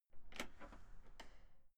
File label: entrance door wide open outside